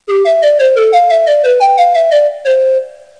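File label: PANPIPE; Talking E-Mail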